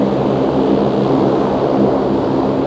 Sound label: plane go